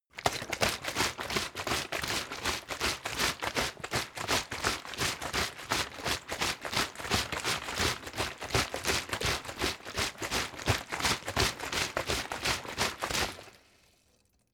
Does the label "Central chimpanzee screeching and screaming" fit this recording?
No